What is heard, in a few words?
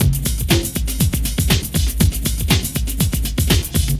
Loop, Roland; Sound & Vision - Gigapack I